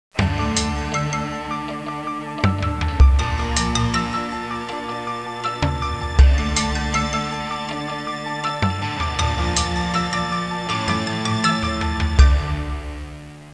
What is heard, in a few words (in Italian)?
suonerie musica hawaiian